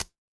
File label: ui tick